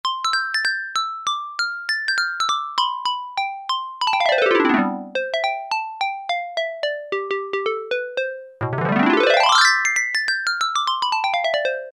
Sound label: Xylophone, Roland Juno-60; Factory presets